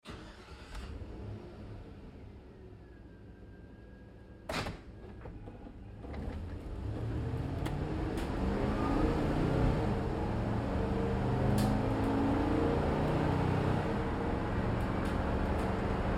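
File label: Truck GMC Savana interior Drive Fast; 2010 GMC Savana,INT,Drive,Fast; Trucks; Vehicles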